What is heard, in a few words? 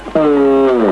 Alert; DarkStar